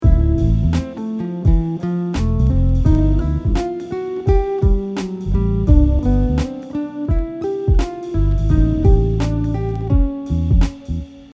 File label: Melody example, guitar